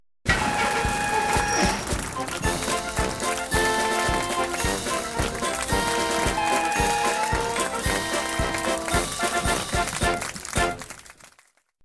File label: level end; Loco Mania